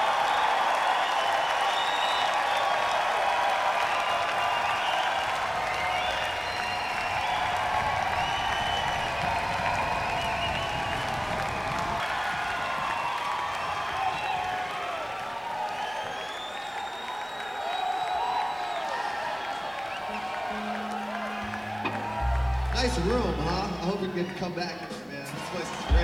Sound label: Crowd; Northampton, MA; Greene Hall